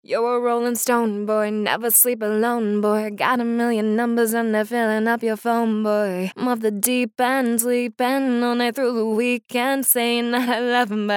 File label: Singing Sample